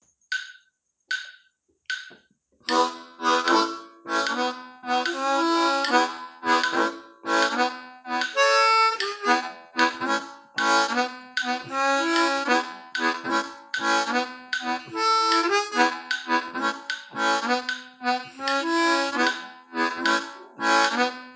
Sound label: solo study, blues harmonica